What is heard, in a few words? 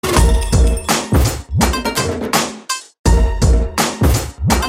Song Bgm